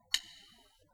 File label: Click